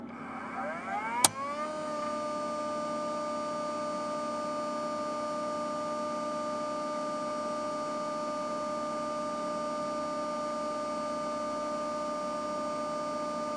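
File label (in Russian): щелчок кнопки и перемотка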